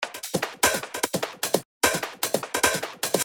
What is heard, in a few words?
hat Wider